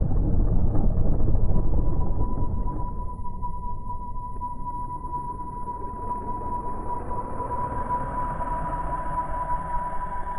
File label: spooky out, music